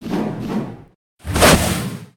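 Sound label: spawn, monster; thedead3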